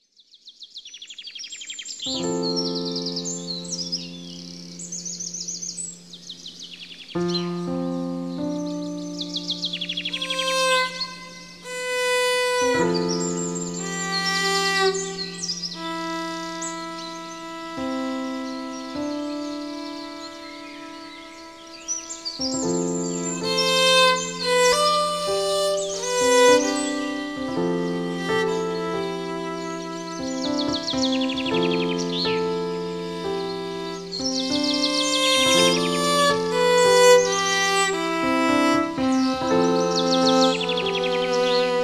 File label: Morning Bird Calls